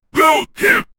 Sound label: agh; movies; omni man